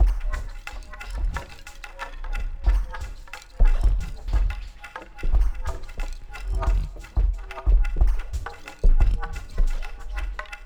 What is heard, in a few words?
Ethnotronic, Processed Percussion